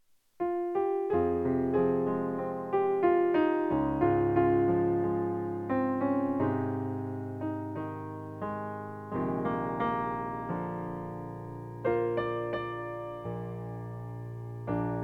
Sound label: PIANO accompaniment